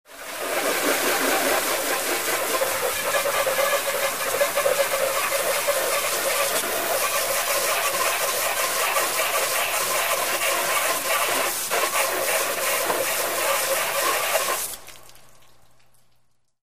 Spraying Metal Car, hose, spray

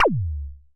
laser; lasers

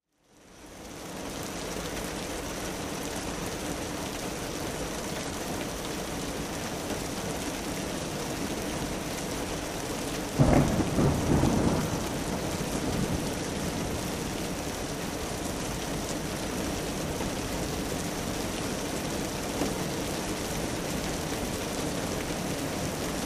Rain Thunder Ambience, rainstorms

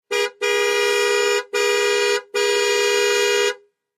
Aston Montego Horn; aston montego ext. horns

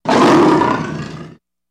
Lion Growl Angry Gene; big cats

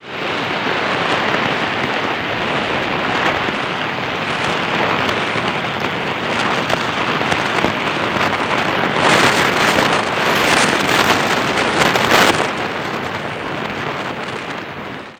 Street Flare Burn; flares

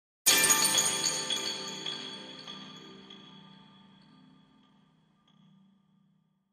Chinese Chimes Bells; mallets

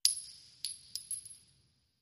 Shell Case Sngle Revb, shell casing movement

